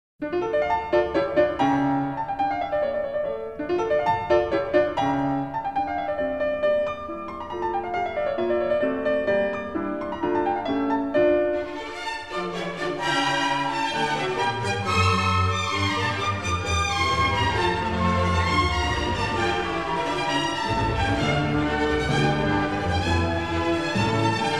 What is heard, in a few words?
Piano Concerto No. 20 in D minor; Wolfgang Amadeus Mozart; Mozart Greatest Hits